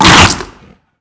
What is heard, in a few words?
wolf bite; Valorant; cstrike; fade